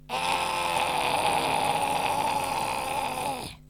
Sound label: Distortion pur